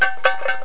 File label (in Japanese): suzu